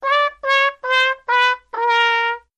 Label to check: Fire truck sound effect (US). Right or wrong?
Wrong